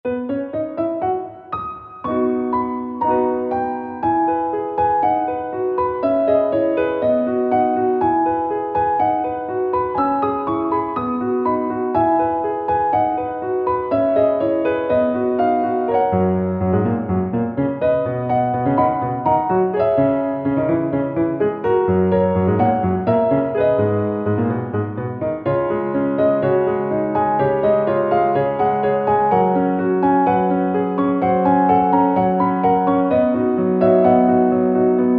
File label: piano